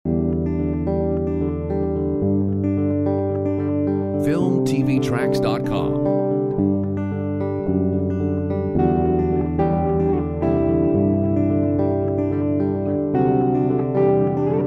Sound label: Acoustic afternoon